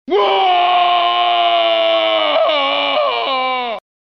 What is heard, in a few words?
fatality scream; mortal kombat 4 jarek